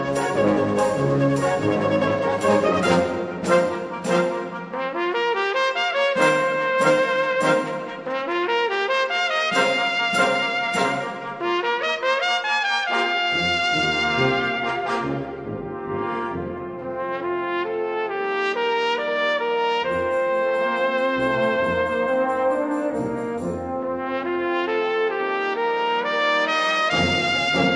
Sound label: Concertpiece for Cornet